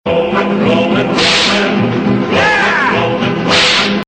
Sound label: COWS